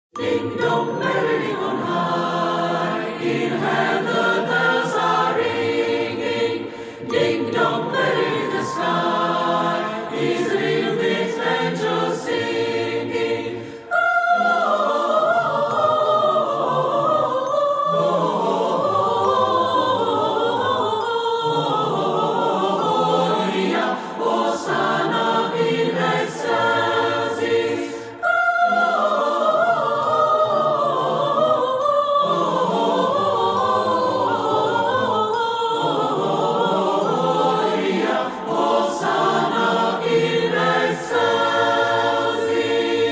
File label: ding dong